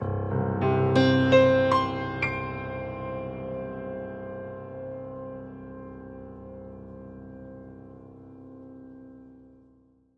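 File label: Grand Piano Dramatic; keyboards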